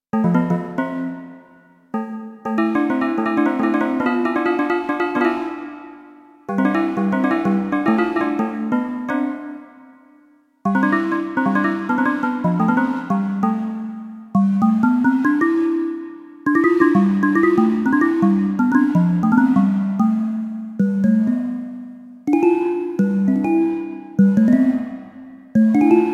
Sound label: Steel Drums, Kawai K1